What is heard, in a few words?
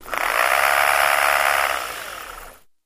Motor, Small; Low Speed, Start, Purr, Stop